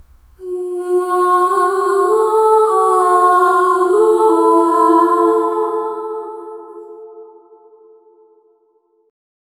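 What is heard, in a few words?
angelic female chorus sing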